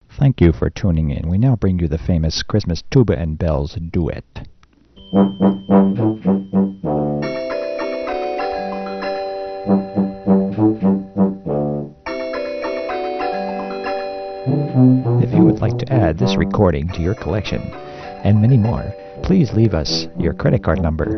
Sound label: Tuba Bells Duet